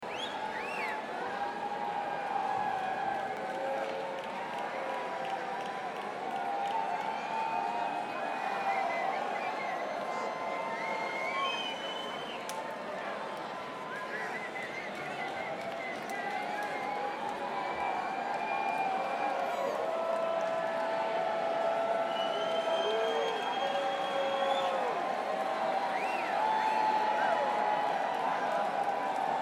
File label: Crowd